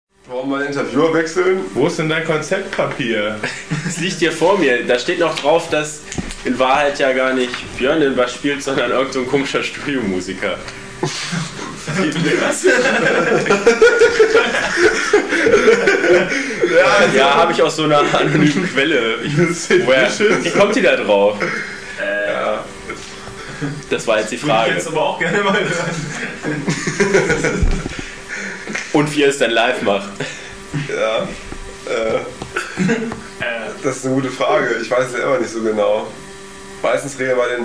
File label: bass